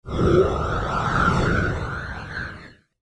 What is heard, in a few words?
airy smooth phase